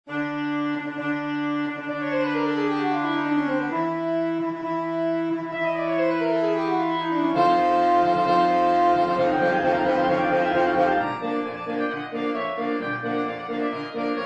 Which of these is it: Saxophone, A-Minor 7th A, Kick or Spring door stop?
Saxophone